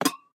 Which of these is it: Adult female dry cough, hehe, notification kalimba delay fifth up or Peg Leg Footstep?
Peg Leg Footstep